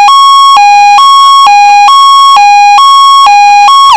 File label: Fed Sig Hi Lo